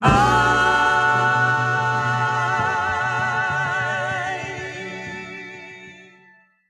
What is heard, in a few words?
aiiiiii